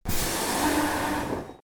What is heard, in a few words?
train door